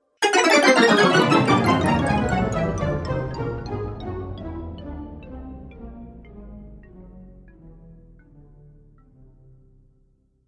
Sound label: Fantasy Magic Slow Time Spell